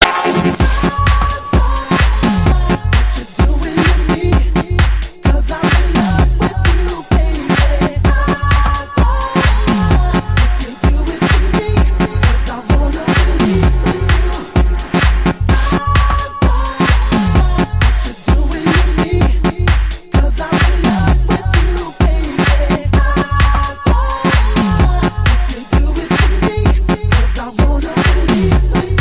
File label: house music ringtone